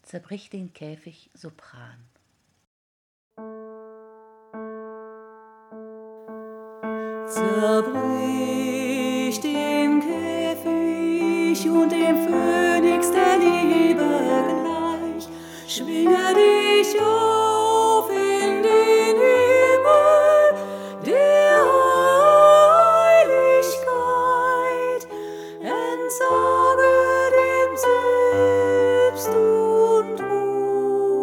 soprano

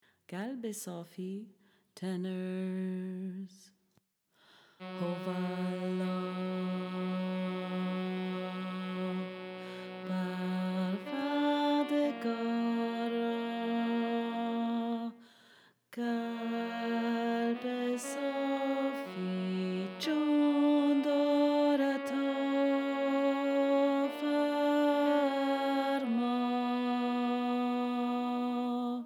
tenor

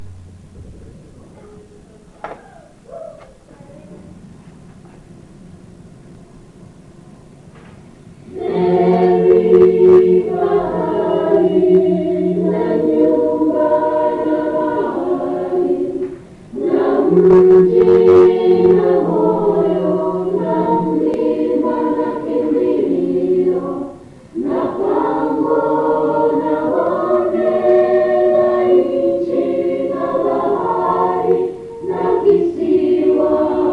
adult choir

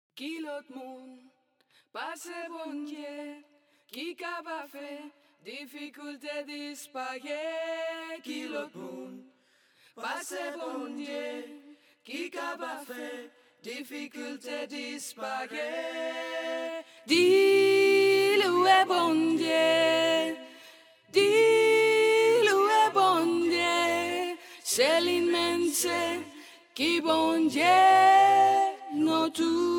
soprano, choral music

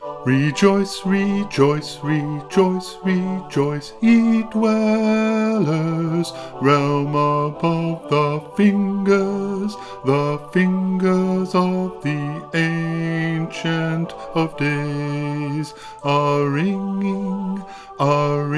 bass, choral music

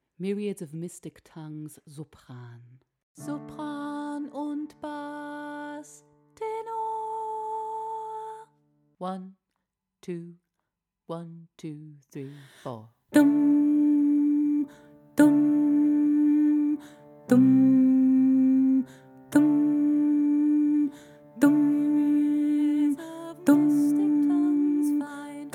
alto

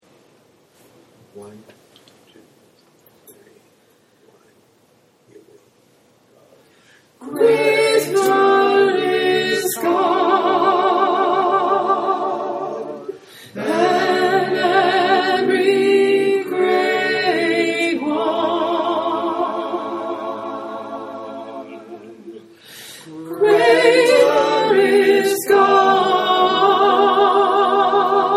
soprano

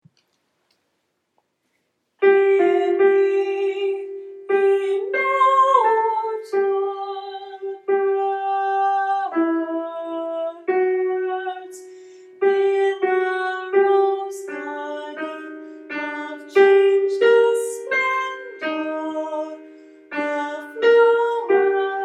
alto; hear me ye mortal birds

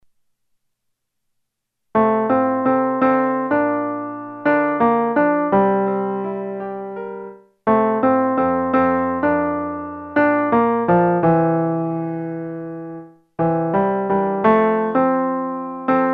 tenor instrumental

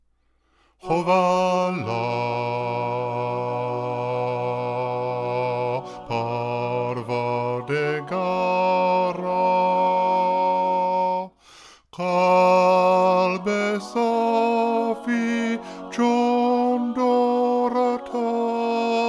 bass, choral music

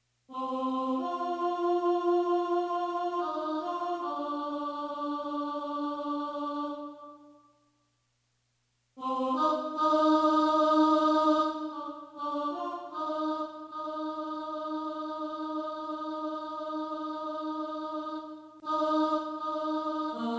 tenor

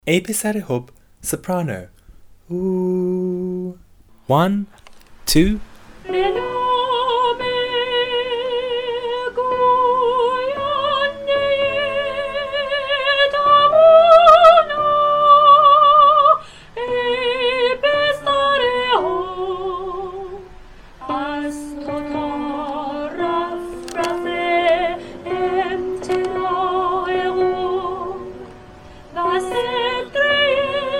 soprano, Choral Music; ay pisar-i-hubb

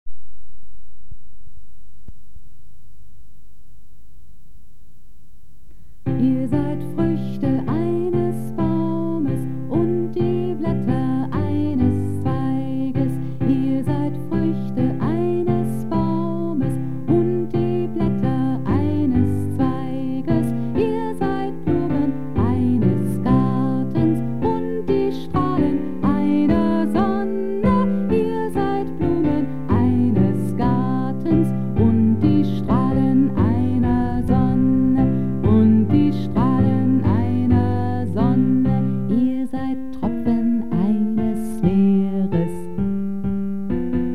alto, choral music

